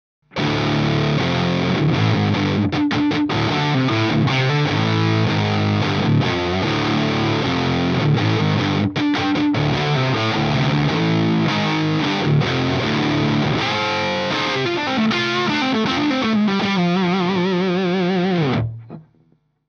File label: FUZZ